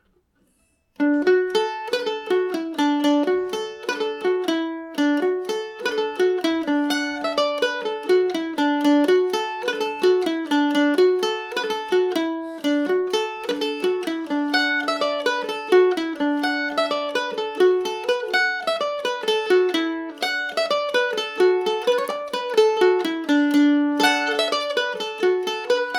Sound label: polka, Irish mandolin; fergus keanes